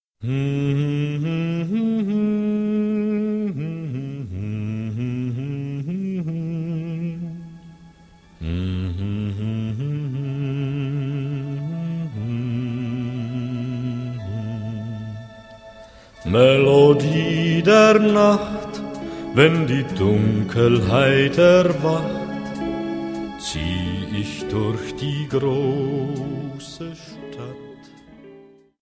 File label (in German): melodie